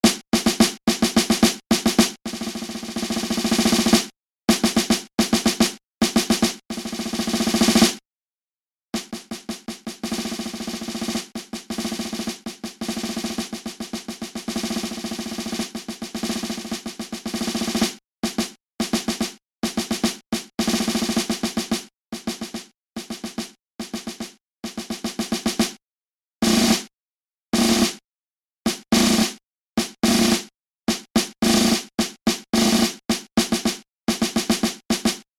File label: snare audition